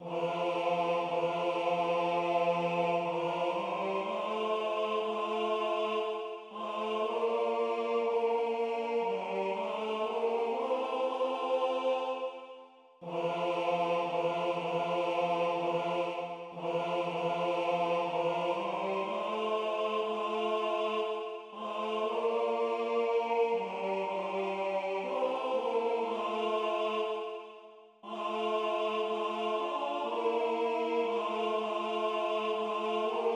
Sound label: Intercession Tenor